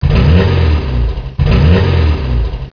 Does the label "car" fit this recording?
Yes